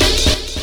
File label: amen